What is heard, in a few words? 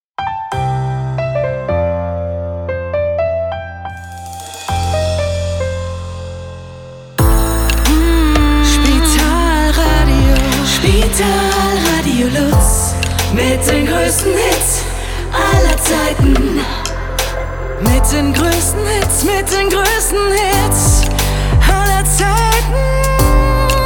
90 BPM JINGLE; lang Vollversion; Spitalradio LuZ